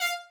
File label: violin